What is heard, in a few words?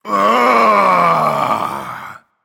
battle cry; smite; geb